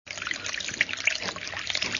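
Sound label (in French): liquide eau court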